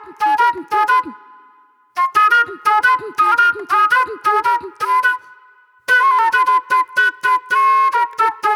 Raw Funk Flute

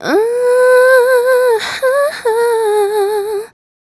Female Vocal

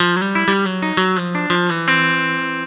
COUNTRY

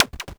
polyvox groove, Polivoks; Formanta Radio Plant

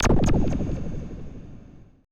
AMBI FX

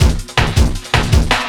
Drum & Bass, 160 BPM

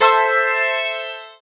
SYN ZOING, dance synth; Sound & Vision - Gigapack I CD 2

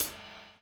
DOWN, DOWN KIT 78

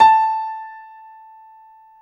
PIA MICRO, MICRO-PIANO; Sound & Vision - Gigapack II

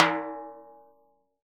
TIMB HI RIM, Synclavier Percussion; ILIO; Synclavier Percussion 2; World & Orchestral

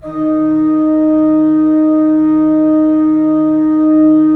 3D Organs Pipe Mix Celeste

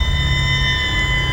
FX, Futurist Drum & Bass

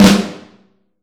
Vintage Snare Drum; Q Up Arts; Johnny.C's Vintage Snare Drum; 7X14

